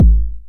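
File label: 909 BD tape, kick drum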